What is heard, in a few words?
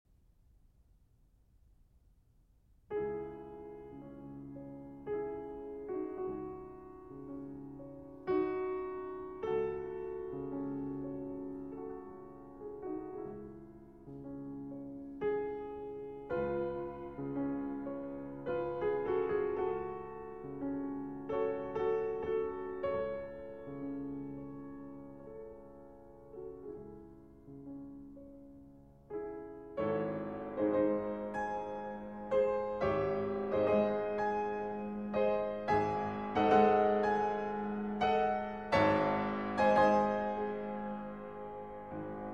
Piano Sonata No. 21 in B-Flat Major; D. 960; Schubert